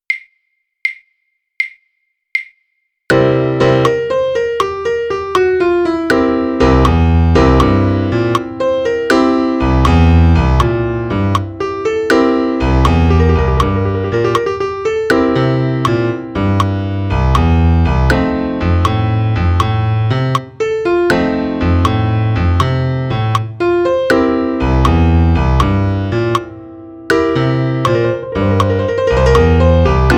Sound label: piano click; blues